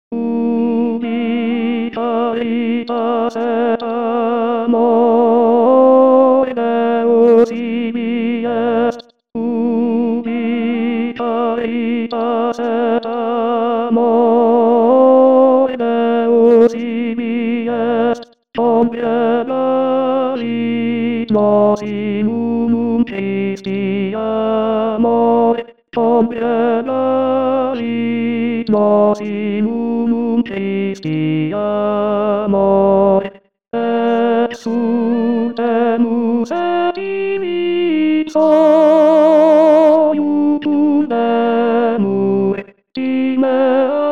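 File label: tenor 1; Durufle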